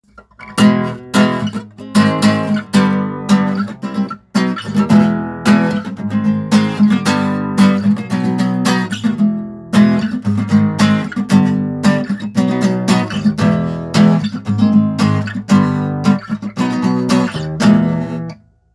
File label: chords